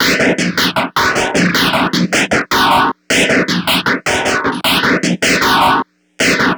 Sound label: CRUNCH, Drumz Grooved; E-MU Producer Series Vol. 6 – Analogia Project